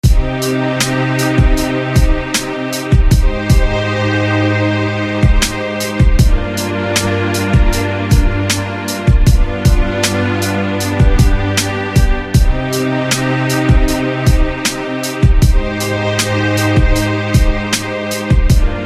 Atmo stringz; SFB FX

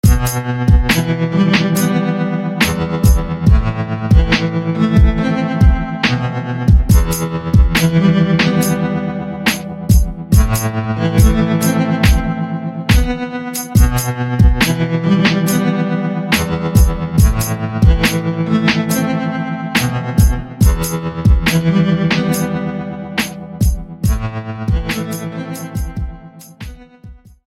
Bang Guitar; SFB FX

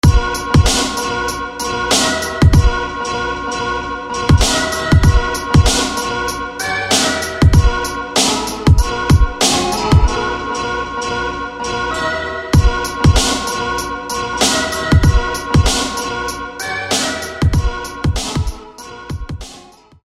Hit; Swordian; SFB FX

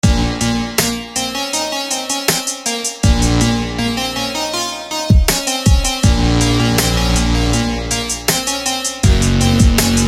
Arc Synth; SFB FX

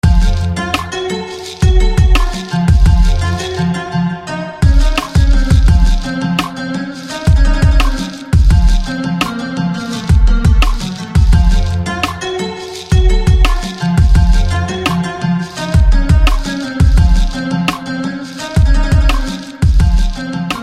Satin Sitar; SFB FX

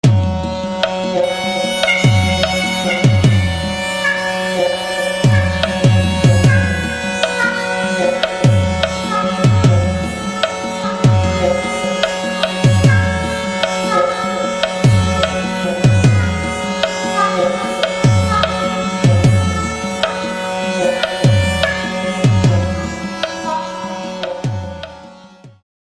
Sarangi; SFB FX

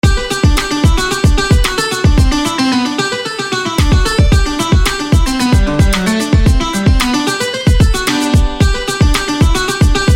Banjo; SFB FX

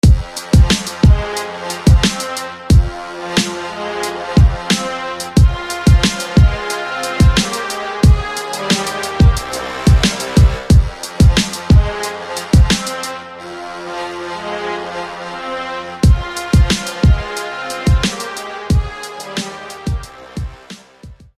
Brass; Brass Stages; SFB FX

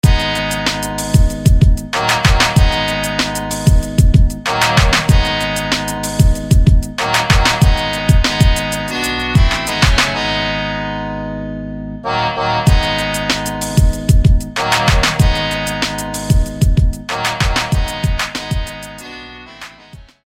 Bayan Hit; SFB FX

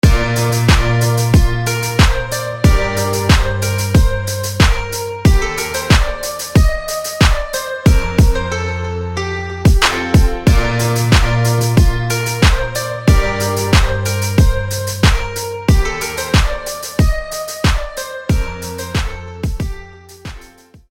Cathedral Keys

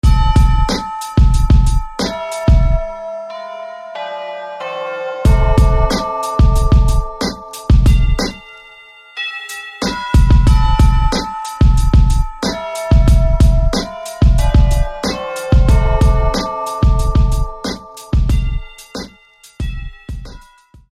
Cathedral Bell

